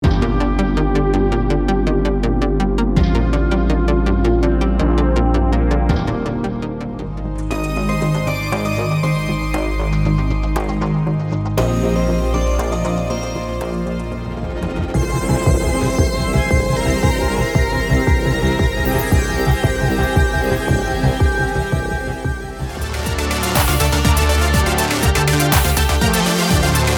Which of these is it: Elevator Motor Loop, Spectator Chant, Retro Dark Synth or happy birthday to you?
Retro Dark Synth